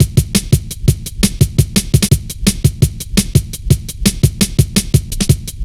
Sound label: wet biscuit, Drum Loops, Drum&Bass, 170; Total Drum&Bass